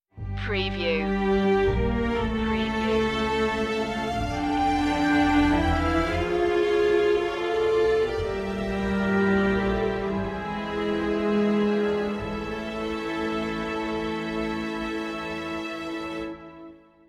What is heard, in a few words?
stinger